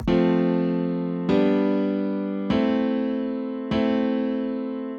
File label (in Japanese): アレンジテクニック ピアノ